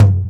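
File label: dundun; ILIO - Ethno Techno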